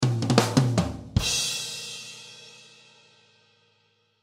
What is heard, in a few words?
Drum Fill Short, Drum Fill Short Beat; Drum Kit; Blastwave